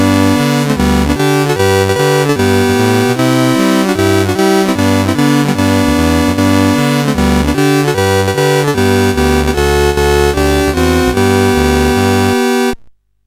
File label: Rocket Ball, CSG8580R5; GAMES